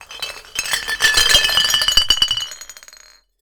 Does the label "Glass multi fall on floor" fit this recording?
Yes